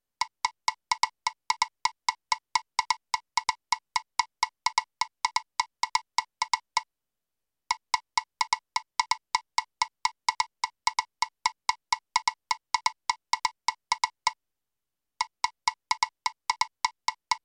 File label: cowbell